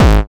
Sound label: gabbakick, audioloops